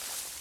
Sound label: grass; firefight